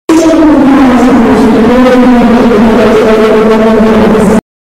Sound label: Kyoki Type Noise